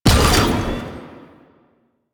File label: Exalted Sniper Cast; Warframe; Warframes; Frumentarius